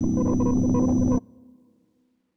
low drone; ゆめ2っき

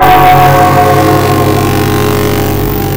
Glitch Bell

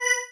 marimba spacesuit; ゆめ2っき

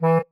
marimba penguin; ゆめ2っき

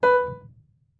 marimba piano; ゆめ2っき

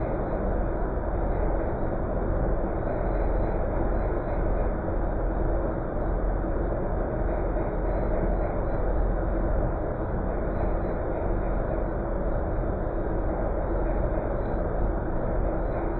moving; ゆめ2っき

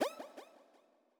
bweep

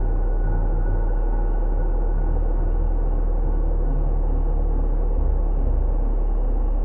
arcturus drone; ゆめ2っき

